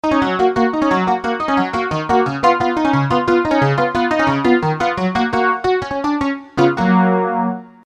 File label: comb; moss